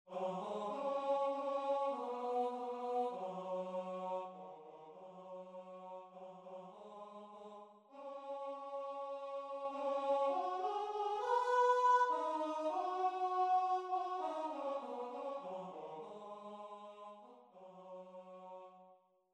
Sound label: pentatonic in b; for singers; pentatonic melody